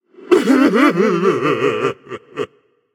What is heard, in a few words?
boo hoo; cerberus